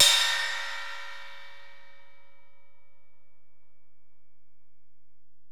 Ride, Alesis DM-PRO

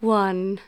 Female vocals and robo-vox

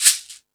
Latin Layers, Drum Machines E-MU Pro-Cussion

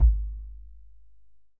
Kick, EMU PX-7 Drum Machines

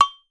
MINIATURE, COWBELLS; Voice Crystal - Steve Reid Definitive Percussion

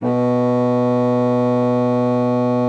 B2, bassoon; Xsample VOL-6

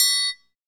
triangle, percussion

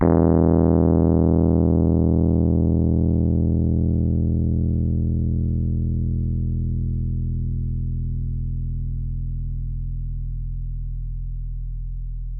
E1 HI, Rhodes Mark II; CEPCo Sample Series Vol. 3

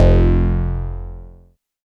Bass, Synths, 5U Modular

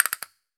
CASTA AMB, CASTANETS; AKAI S-Series CD-ROM Sound Library VOL-5

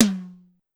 Tom Hi, Pitched Up; Clavia DDrum-AT

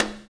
Conga; Equipped Music; Loungin House

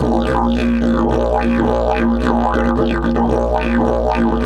108, DIDGE 108BPM

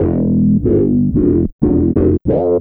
Funk Riff

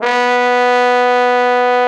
TROMBO; Trombone

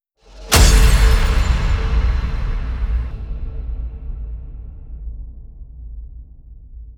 Impact

